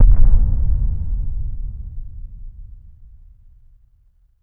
Factory Explosion; gamerip; Plants vs. Zombies Garden Warfare 2; PS4, Windows, Xbox One

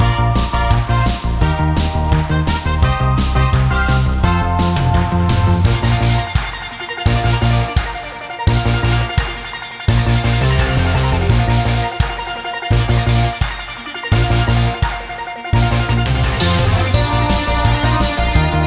80s Menu

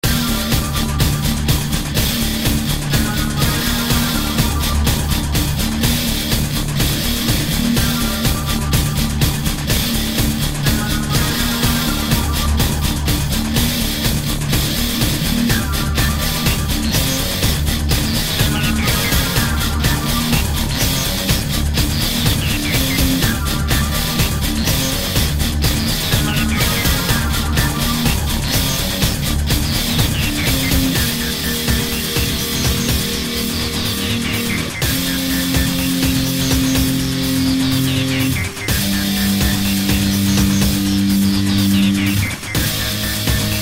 Ring Music

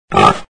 Boss Damage; Angry Duck Cannon 1.5

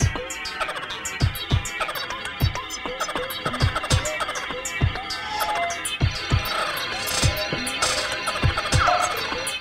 Happy Town E - Cartoon

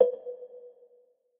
Move B 4 Mf

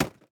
plastic hit

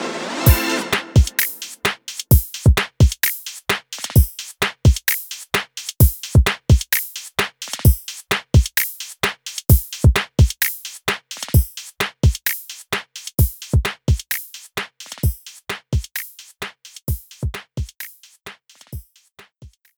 over the Radio (Beat); Splatoon 2; Off the Hook

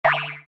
Ninja Die

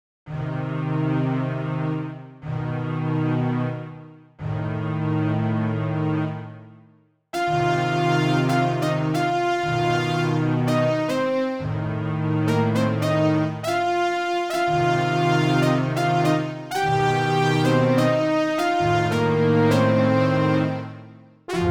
Roland SC-88; simcity 2000

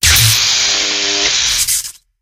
Pigs Electrocuted

